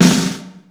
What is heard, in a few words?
snare